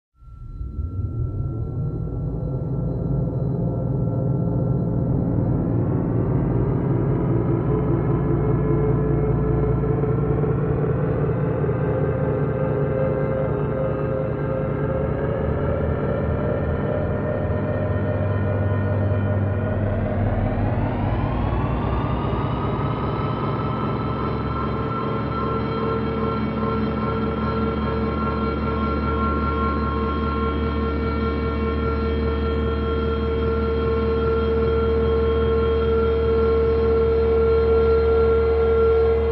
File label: Electronic signal, energy buildup; radio signals